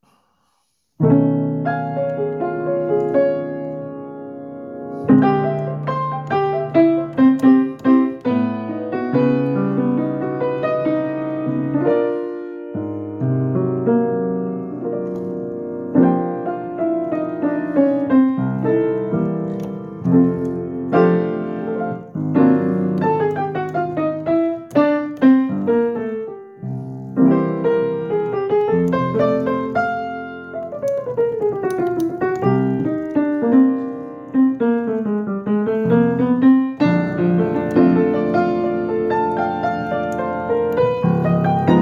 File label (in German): Neubesaiteter Fluegel klingt